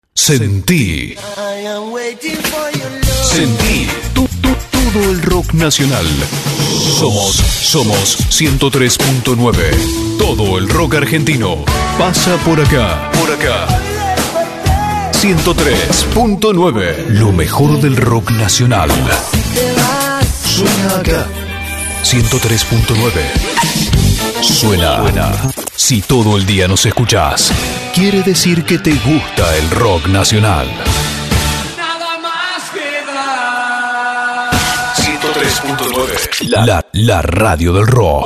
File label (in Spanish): ROCK NACIONAL